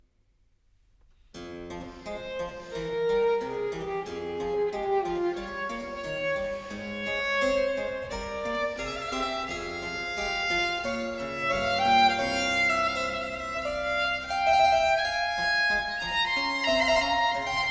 Sonata No. 2 in A Major, Andante un poco, Violin and Harpsichord; BWV 1015; Bach; J.S. Bach